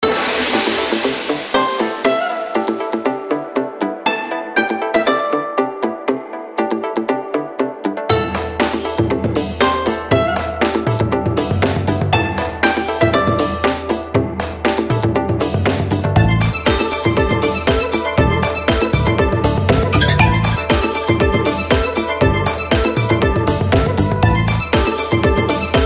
main music; Ninja-Action-2

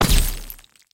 match; YummiCookie; French

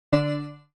character lvl; sweetcandymania

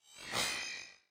scanned; star-wars-rebels-team-tactis

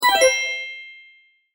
lose; 2048legend

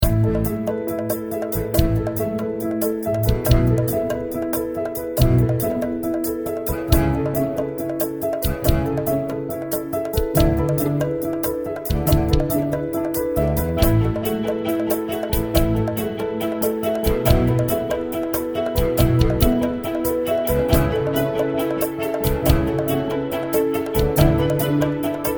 hudba menu; sweetcandymania

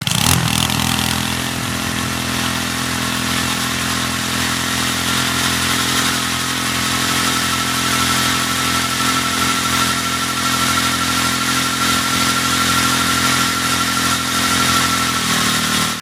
acceleration; Stud-Rider

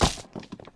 object hit concrete; lightning speed

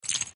chips; pharaohslotscasino

